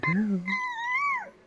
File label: squeak